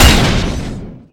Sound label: damage